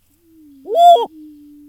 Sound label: whoop sound